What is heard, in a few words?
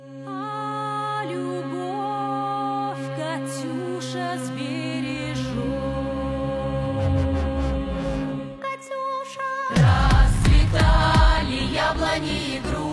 Epic version